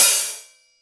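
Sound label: HI-HAT CLOSED; Greytsounds; HI-HATS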